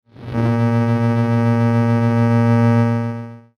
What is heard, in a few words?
Electrical Hum Warble; Production Element Imaging Element Accent Transition